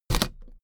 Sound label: suitcase hit empty case